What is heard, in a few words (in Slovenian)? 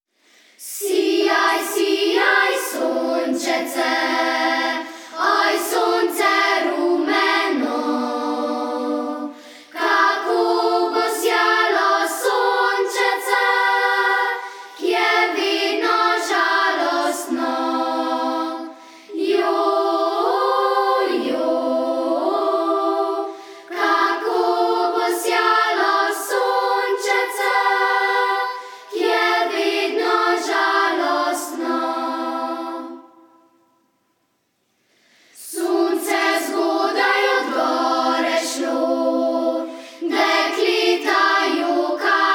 ljudska sijaj sijaj sonce otroško večglasje